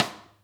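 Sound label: side stick, Interactive Drum Kits; Sonic Reality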